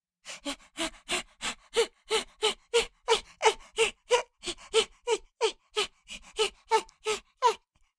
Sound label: Cartoon little child voice breathing